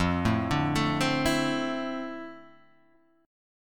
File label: F Major 7th Add 6th, guitar chord; FM7add6 Chord {1 0 0 2 1 0} arpeggiated